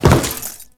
Step Default, Footsteps; Warframe; Grineer

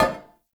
Stone Cafe Table Impact; Warframe; Ambience; Nineteen Ninety Nine

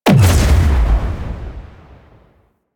Pillar Ship Missile Explosion; Warframe; Vehicles; RailJack; Proc Ships; Corpus

